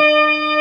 B3 E 3, organ; VOCAL ORGAN; ORGAN #2

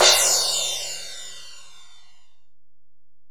Ride, Alesis DM-PRO

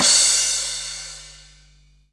CRASH, Dance Mega Drums; Best Service; CRASHES

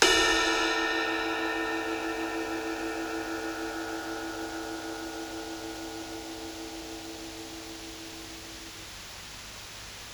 Ride, Psychedelic Drums

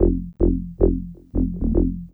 Funk Riff

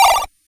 Game Boy Chord Loop, Nintendo Game Boy Synth

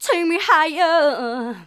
Female vocals and robo-vox

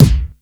PLAST KICK, PLASTIC DRUM; Crypton - DrumBase5000

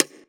Conga; Equipped Music; Loungin House

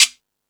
Latin Layers, Drum Machines E-MU Pro-Cussion

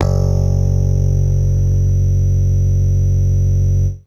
Electric Bass, f#1, FAIRLIGHT; Retro Sampler

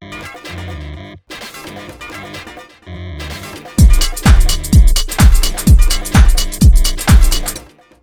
127 all 4 L, Fresh Disco House; USB Soundscan vol.20; AKAI

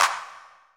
REV CLAPS; Greytsounds; Scott Peers Sound Engineering

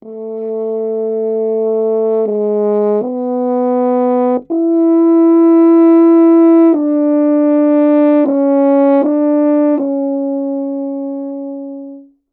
80 french horn, Orchestral arrangements, F#min; PowerFX - Downtown Orchestra

